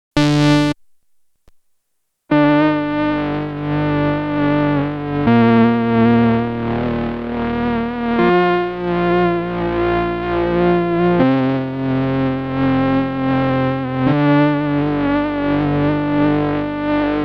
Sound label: Synth Worn VHS; Source Audio Nemesis